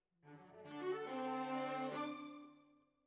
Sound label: Violas, jazz for piano